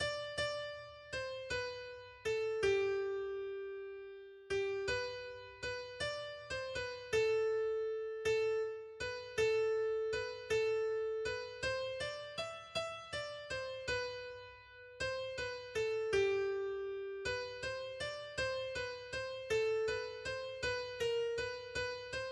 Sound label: Bagpipers Carol